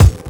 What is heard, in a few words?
BD, Dance Mega Drums, HIP